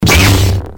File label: saber on fast; lightsaber